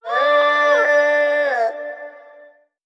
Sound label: areyah; ooh ahh